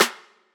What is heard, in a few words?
clap one shot; mask; Slate; SD PASADENA Pop Sample Pack